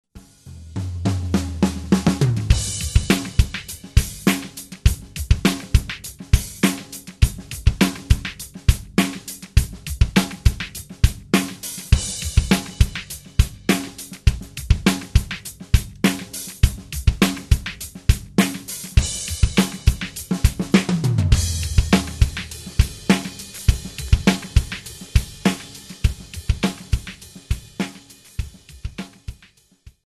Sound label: Drum Sounds with loop